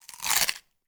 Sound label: Celery, Bite, Crunch, Slow, Bone, Break, Stick, Creak, Various; Mattia Cellotto; Crunch Mode